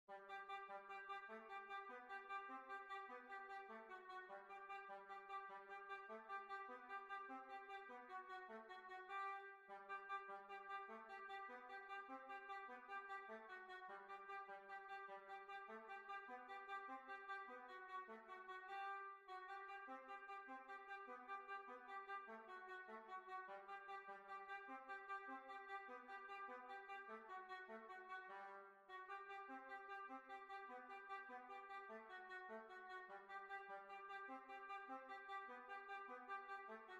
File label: Holmfirth Jig